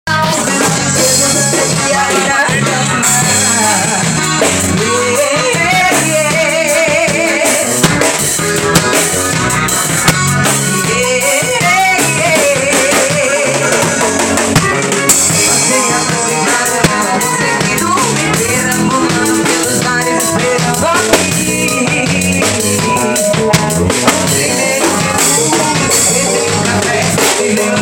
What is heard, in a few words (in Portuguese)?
forro ensaio